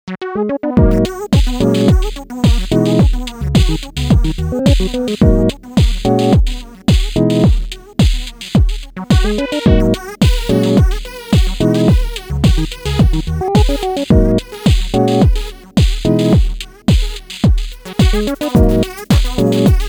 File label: delay song; weeklybeats-2020